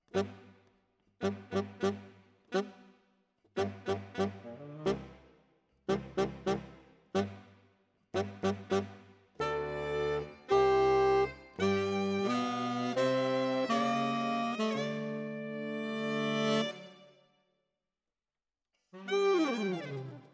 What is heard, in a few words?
Tenor, RNR for piano